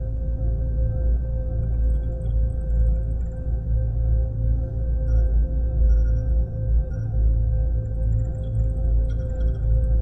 computer ambience; deltarune yellow translation

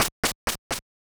escaped; deltarune yellow translation

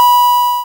chem go; deltarune yellow translation

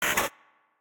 vcr; Petrichor PSTVL

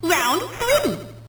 boxing round; deltarune yellow translation

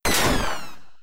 shot mid; deltarune yellow translation

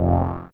spear appear; deltarune yellow translation

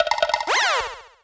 defeat run; deltarune yellow translation

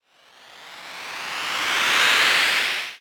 charge throw; Petrichor PSTVL

